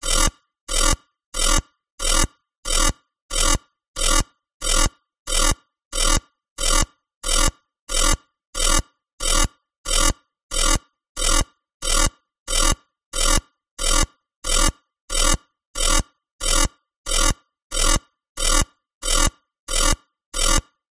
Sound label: science fiction console alarm; zapsplat